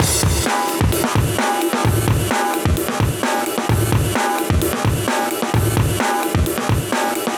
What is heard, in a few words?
130 Live Beat, breaks